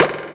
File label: Percussive; Percussion; Mutekki Media; Vengeance Electroshock Vol.2